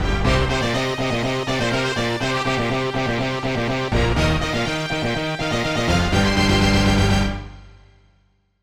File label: ringtone cavalcade